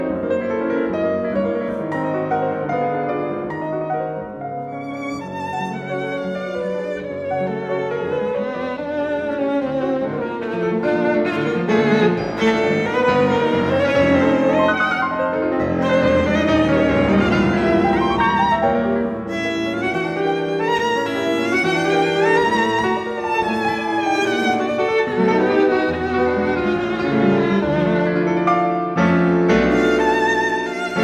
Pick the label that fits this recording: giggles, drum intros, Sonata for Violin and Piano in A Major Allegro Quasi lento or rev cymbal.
Sonata for Violin and Piano in A Major Allegro Quasi lento